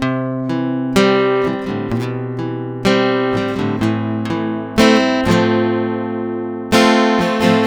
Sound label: Guitar 125 BPM (B Minor), Acoustic Guitar; Rain On The Creek; GhostHack; Free Guitar And Piano Samples